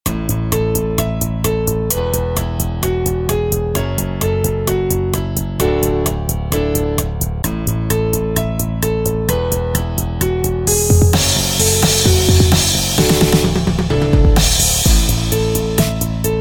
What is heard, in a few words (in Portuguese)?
batida